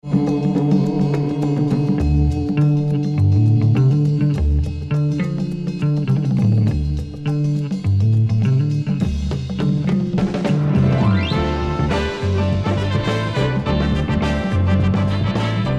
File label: Vocal instru Ens